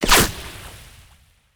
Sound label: water hit, damage; DCS